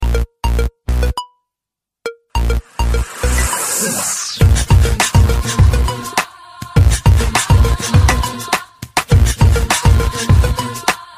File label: beat samsung new ringtone, sms